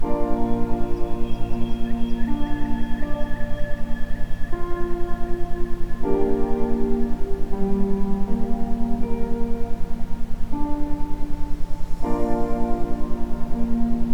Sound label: isochronic, Ambient Sound; Entspannung Erneuerung; isochrone toene